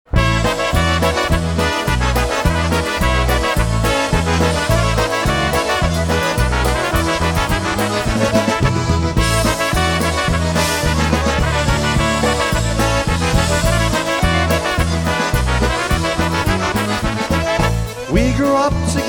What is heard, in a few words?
Polka, Live Jam